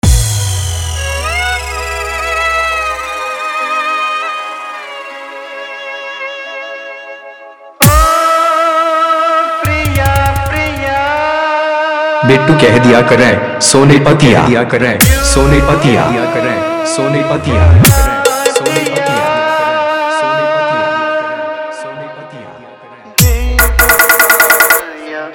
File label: Remix Hindi Song